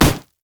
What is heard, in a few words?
red mech melee basic attack; minions